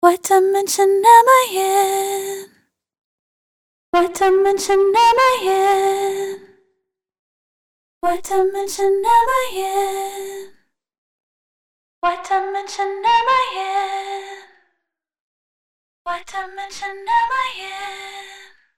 Vocals

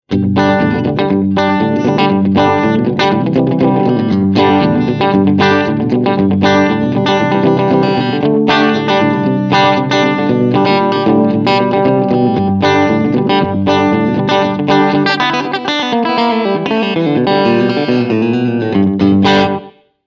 Slapback Delay

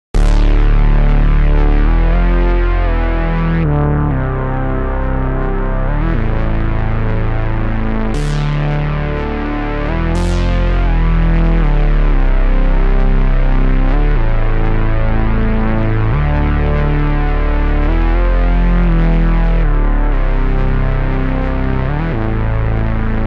Softsynth Dry; EQP

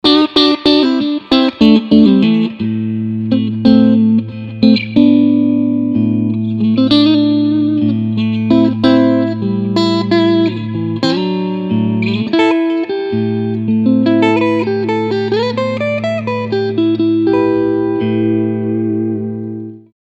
Cleanchannel Dynamic Pickin

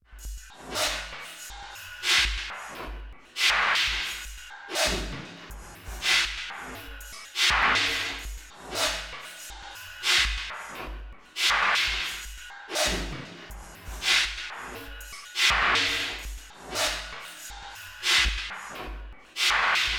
Drums filtered reverse time modulation; Erica Synths Black Hole DSP2

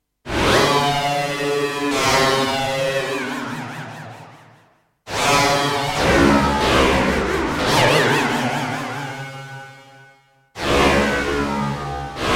SFX Dinosaur Bark

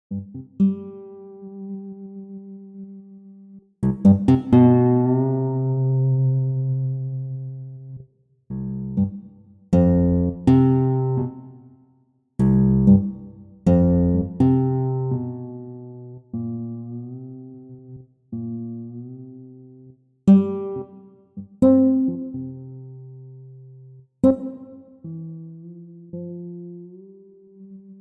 Dynamic Marimbaphone

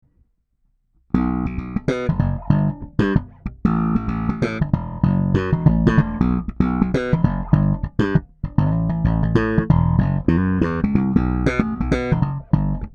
Harley Benton BZ 5000 Slap passive

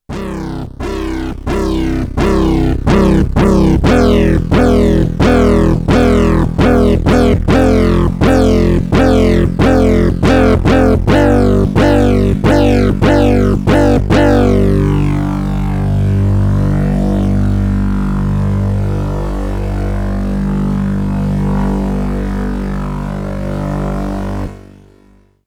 Modal Argon8

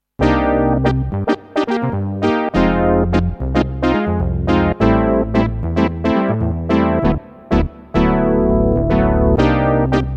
TX7 Brass

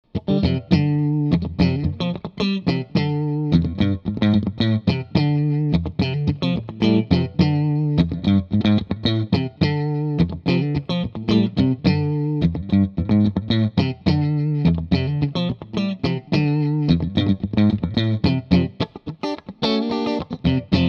Funky

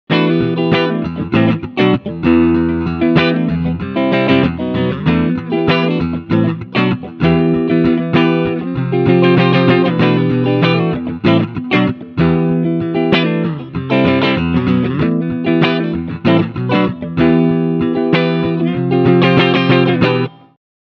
strumming singlecoils

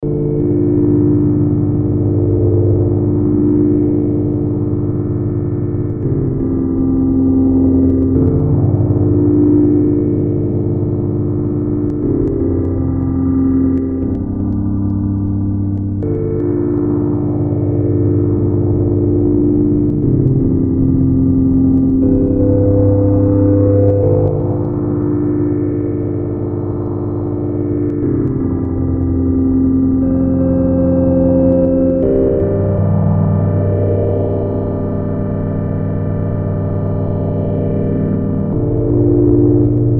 Arturia CMI V

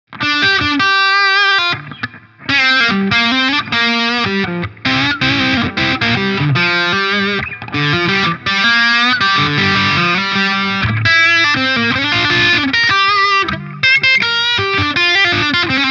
VS Audio Aftermath Gain

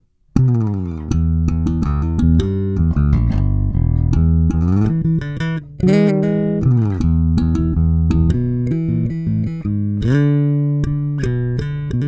Both active neutral

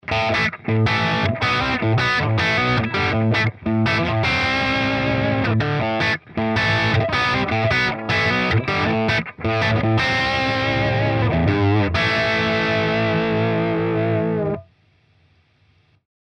Tight Crunch; Archetype Abasi

